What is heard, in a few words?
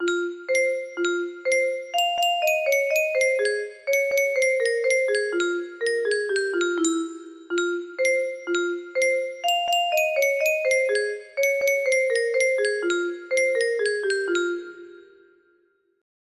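music box melody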